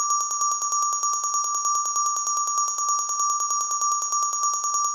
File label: big win count up loop; netent